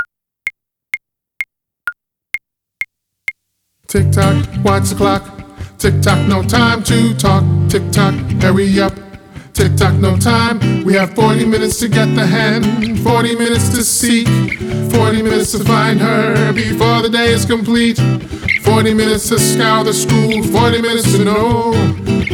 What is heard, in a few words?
Tick Tock (Vocal); Mini Musical Tales